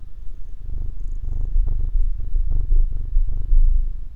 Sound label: PURR cat synth